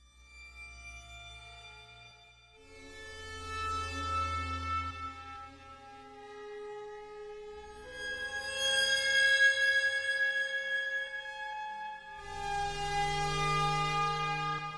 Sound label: glow atmospheric background